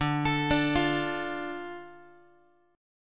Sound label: D Minor D Guitar; Dm arpeggiated; JGuitar